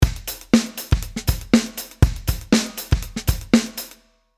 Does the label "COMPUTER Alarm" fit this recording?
No